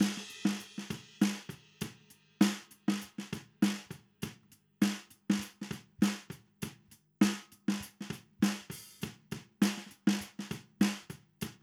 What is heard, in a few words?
Snare Notch In